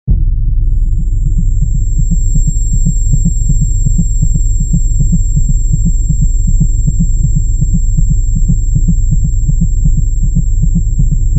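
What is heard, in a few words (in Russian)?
шок контузия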